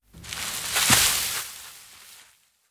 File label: Drop into bush; The 39 Steps